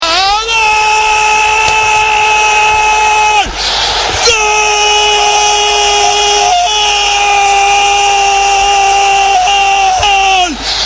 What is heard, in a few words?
Goal